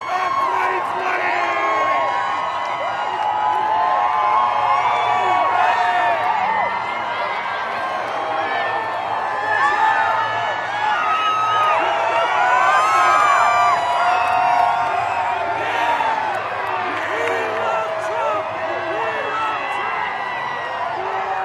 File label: crowd Cheering For Trump Motorcade; MAGA crowd; DC Rally